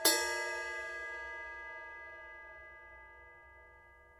17in Crash; ZBT; cymbal

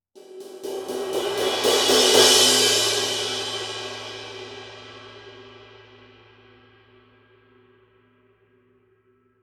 22 Medium Thin High Crescendo, cymbal; Kerope

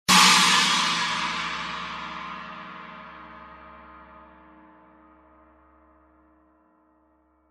20in China, cymbal

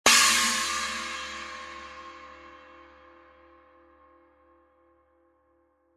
16in China High

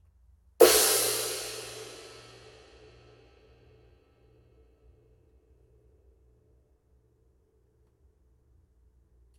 20in Light Flat Ride, cymbal sound

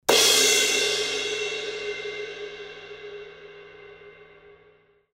K Con Med Thin Ride Low Crash, cymbal

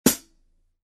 13in Custom Dark HiHats, cymbal

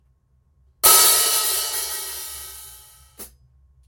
15in Heavy HiHats, cymbal

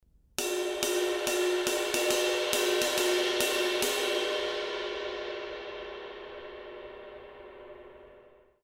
K Con Overhammered Ride Ride Pattern, cymbal

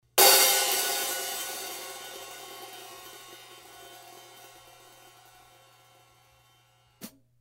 A Avedis Open Hit, cymbal

